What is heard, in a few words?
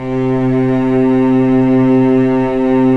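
strings, mf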